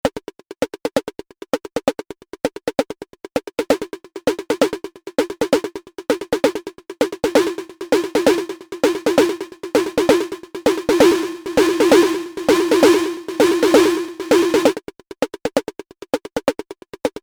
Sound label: Moog DFAM Snare Drum